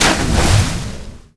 impact; cstrike; war3source; morphling

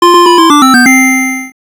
slots win; garrysmod

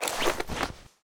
mac10 deploy; garrysmod

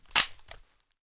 mp5 bolt forward, weapons; garrysmod

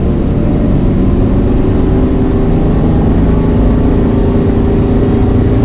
third shift accel up, car; vehicles; garrysmod; sincar

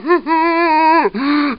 zombie voice idle; csgo

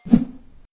woosh, blades; garrysmod

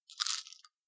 m60 belt remove; mg; garrysmod

